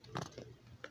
pig snore fart

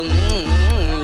tounge sound

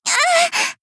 moaning; pokemon masters

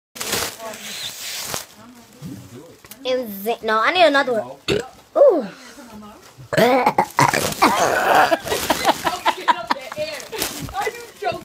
burp helium so funny

